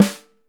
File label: 5X14, Drums